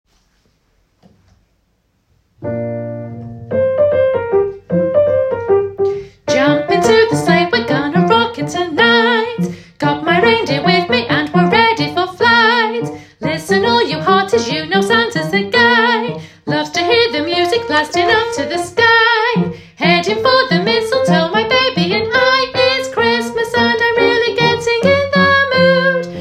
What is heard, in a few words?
in the christmas mood sop